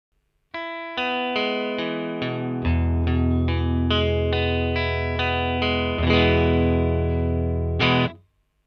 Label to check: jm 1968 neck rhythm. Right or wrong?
Right